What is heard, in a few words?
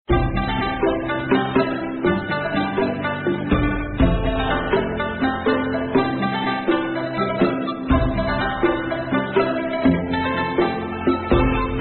bgm